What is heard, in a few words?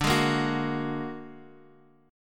A sharp Augmented 7th, guitar chord